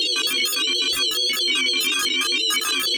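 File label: FM COMPUTER, FM SYNTH; 1000fx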